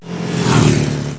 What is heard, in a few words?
carpass; vehicles; gfactory; tgf